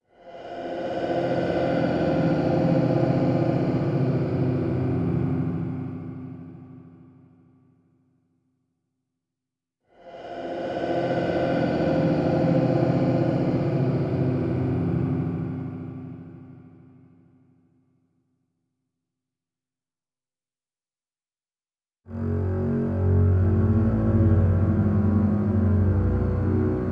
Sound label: scary